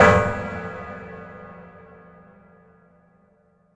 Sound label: STAB The Bad Chord; Polyend; Plughugger